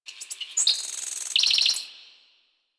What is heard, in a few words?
Bird Close; Ambience; One Offs; Birds; Warframe; Quests; The New War; Drifter Camp